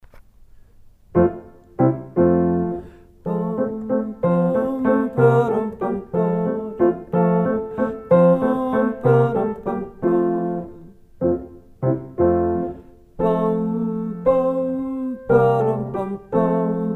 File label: French Folk Song